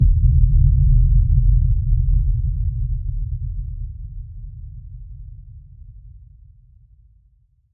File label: hit low